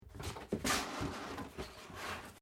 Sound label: Cardboard box top fold; Material components for constructing more complex sounds